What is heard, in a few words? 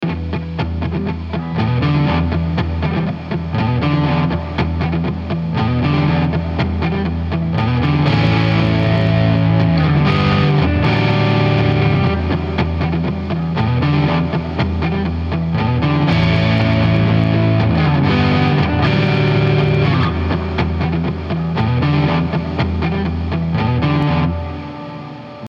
Em 120 rocky riff